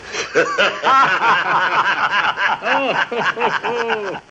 SARCASTIC LAUGHTER

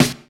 original snare sound b key

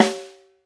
phat snare single hit g key

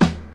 natural kickdrum sound D sharp key

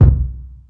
hot kickdrum b key; kick drum samples

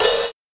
light open hat sound a sharp key

